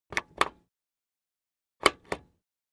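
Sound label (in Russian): включение выключение света над сиденьем самолета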